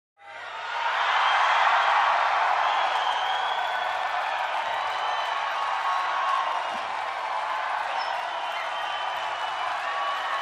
Crowd cheerfully clapping and whistling

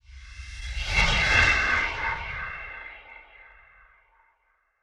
Distance dash

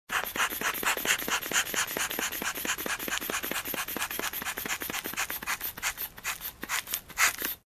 Blood Pressure Cuff fast pumping

